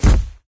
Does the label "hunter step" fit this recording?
Yes